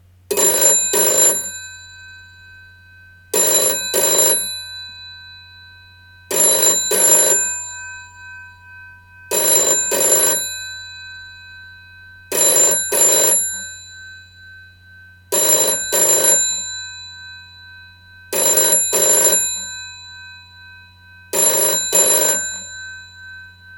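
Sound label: British GPO Telephone Model 706 Ring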